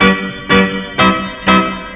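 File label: PIANO loop; USB Soundscan vol.27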